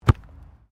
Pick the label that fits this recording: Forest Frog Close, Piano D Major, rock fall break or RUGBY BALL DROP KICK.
RUGBY BALL DROP KICK